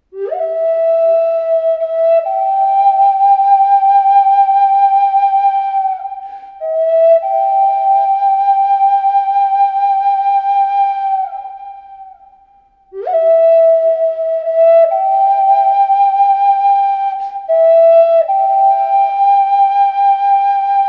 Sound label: Zuni Sunrise, indian flute